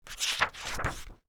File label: page turn